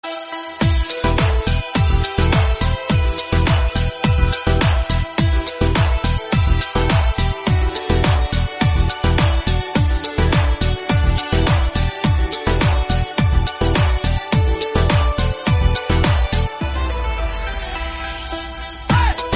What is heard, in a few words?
remix instrumental